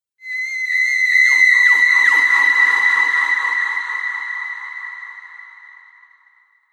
Screaming Surreal Horror; Screaming Sound Effects

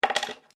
Coin Single Dropped Wooden Surface; Common coins; Money

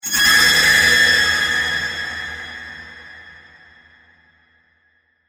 Digital Screech, Reverb; Production, Element, Digital

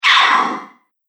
Spirit Chomper, ghost sound effect; Horror Sound Effect

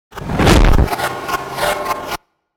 Noise Warble Warp; 5.1 STEREO MIX; Blastwave

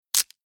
Wood Bamboo Stick Break Tare Snap; Wood Sound Effects

